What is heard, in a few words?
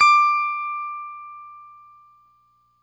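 Stratocaster, Guitar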